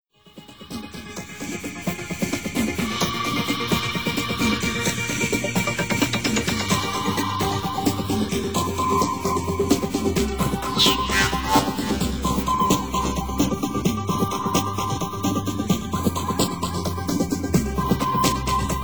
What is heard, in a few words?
Transdental trance mix